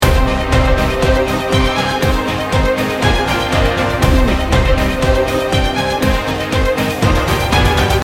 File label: warrior march loop